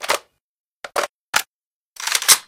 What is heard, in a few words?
val reload, weapons